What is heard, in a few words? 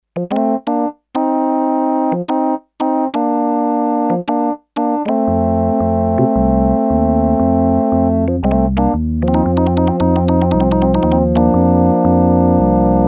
Percussive Organ 3, Sequential Circuits Six-Trak; Factory Programs